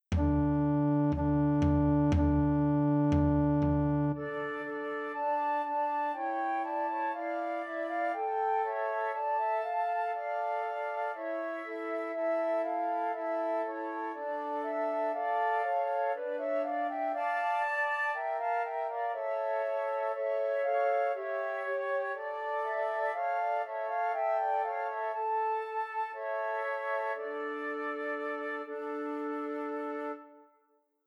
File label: Trios for Three Descant Recorders; Christmas Trios